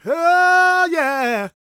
GOSP MALE, Vocal Planet Gospel

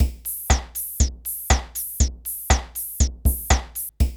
BREAK

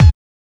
HSE-BD

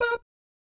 BAP A#4, Vocal Jazz Fx; Spectrasonics; Vocal Planet Vol.3 Jazz Fx

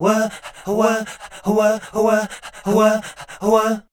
SCAT, Voice Spectral

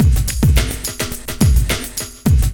LOOP, DRUM; MLOP DRUM; E-Lab; X Static Goldmine 2; akai

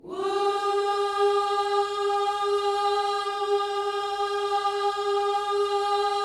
WHOO G#4, Vocal Gospel; Spectrasonics; Vocal Planet Vol.1 Gospel; akai

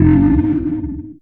BASS FX; E-Lab; X Static Goldmine 2; akai

